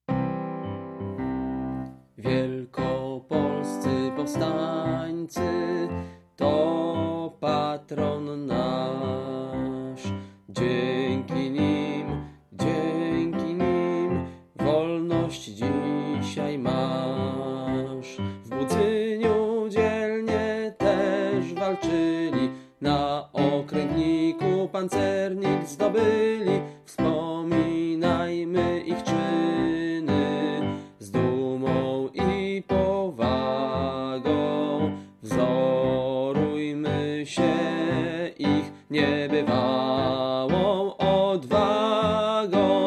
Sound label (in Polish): Spiew plus podklad; Hymn Szkoly im Powstancow Wilekopolskich